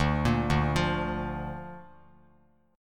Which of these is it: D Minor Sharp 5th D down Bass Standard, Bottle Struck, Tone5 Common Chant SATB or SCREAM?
D Minor Sharp 5th D down Bass Standard